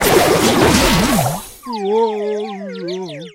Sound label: Armored Machine Death